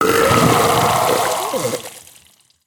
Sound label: barf